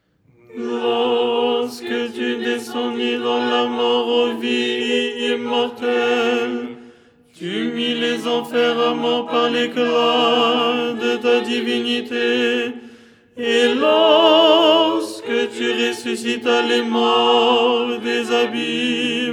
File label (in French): tenor